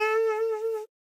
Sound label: Pungi Note